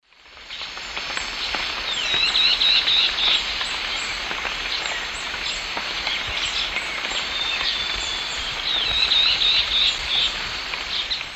Myrmotherula longipennis zimmeri, call, S. America Eastern Lowlands